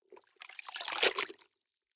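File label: clothes water up down medium, household; haus-garten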